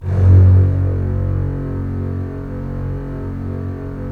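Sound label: Strings contrabasses; Roland - LA Composer vol. 4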